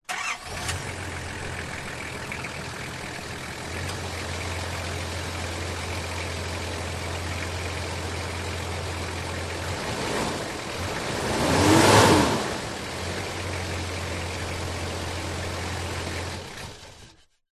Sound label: car SUV Nissan Patrol diesel on idle rev off front ext; vehicles